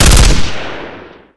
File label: famas burst; czero opforce